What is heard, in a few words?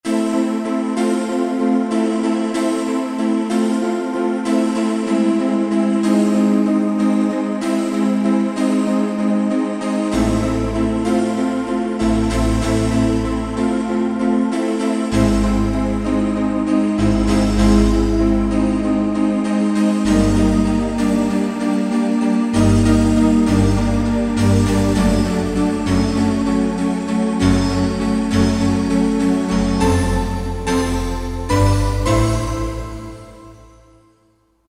Vox Piano, Roland SR-JV80-03 Piano